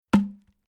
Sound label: Forestry Dull Hollow Wood On Wood Logs Impact Single